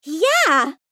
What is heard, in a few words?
Female win reaction